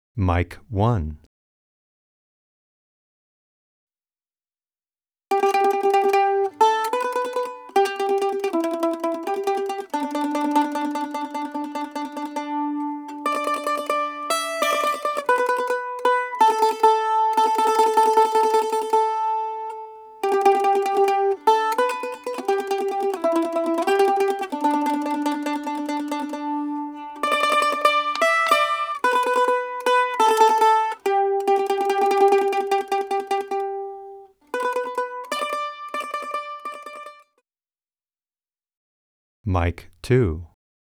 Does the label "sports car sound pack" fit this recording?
No